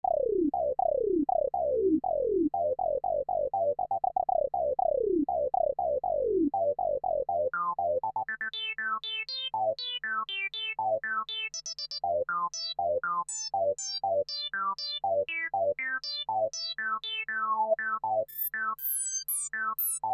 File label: E-mu ESI-2000 filter, lpf36 q100